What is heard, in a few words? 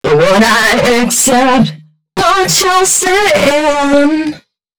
female c#, Dance Ultra Voice FX; Mutekki Media